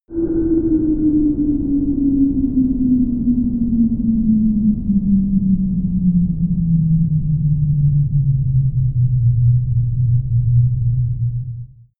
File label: dark distant fall giant bomb